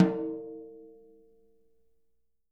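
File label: jazz snares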